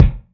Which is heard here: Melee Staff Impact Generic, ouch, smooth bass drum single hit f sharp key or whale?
smooth bass drum single hit f sharp key